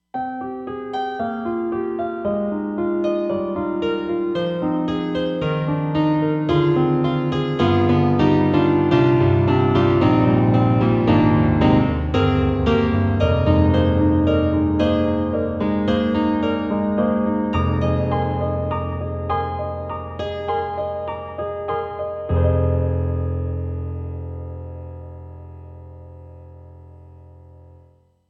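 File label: Roland SD-50 European Piano; GM2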